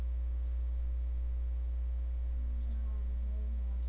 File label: scratch